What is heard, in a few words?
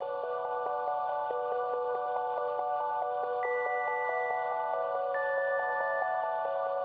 atmospheric pad dry; wavefield